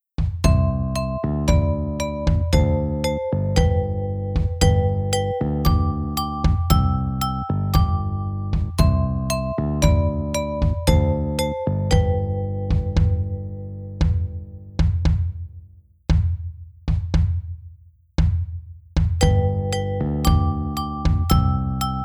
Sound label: I Am Calm Instrumental